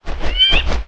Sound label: watchman damage